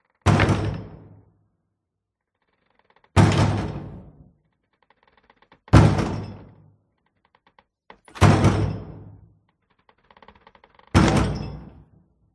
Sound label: battering ram hit door multiple